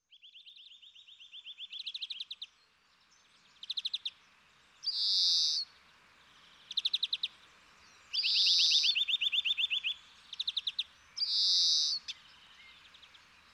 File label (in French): verdier et sittelle